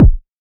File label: fluffy steel kick drum G sharp key, kick single shot tuned to the G# note